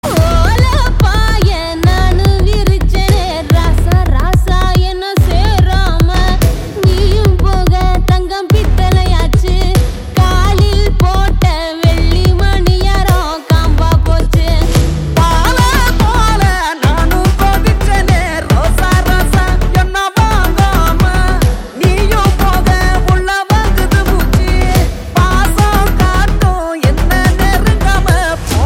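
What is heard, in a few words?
background music Ringtone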